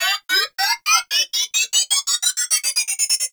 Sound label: Trapper Grenade Warning; Prime Grineer; Warframe; Tau